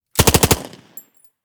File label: Submachine Gun - Tommy Gun - Burst; Fascinated Sound - The Gun Locker SFX Pack